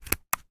technology camera digital memory card push into slot